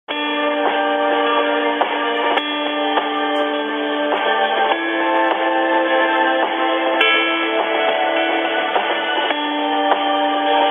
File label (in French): Musique d'Attente; Orange Telecom